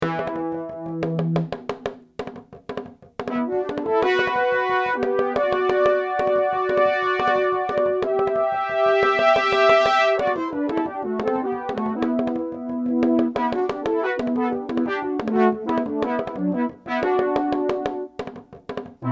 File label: Synth Soft Brass; Randy Felts